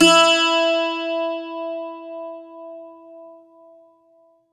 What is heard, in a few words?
bouzouki; Best Service ProSamples vol.52 - World Instruments 2; AKAI